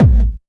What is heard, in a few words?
KICK hardcore, Techno Trance DRUMS